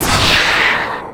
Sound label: attack; templar assassin